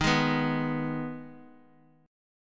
D sharp Suspended 4th Sharp 5th

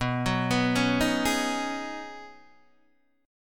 B 6th Add 9th B Guitar DADGAD, chord

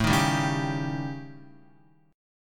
A 7th Flat 5th, down, Guitar; A7b5 strummed; JGuitar

